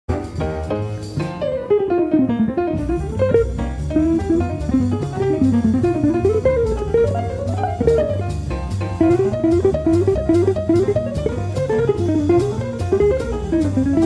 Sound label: Solo; PODCAST RADIOFREESTATION